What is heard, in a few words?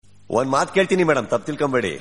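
Kannada message tune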